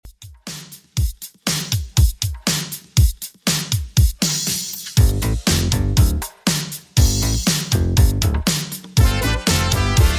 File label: 80s 120bpm; Redrum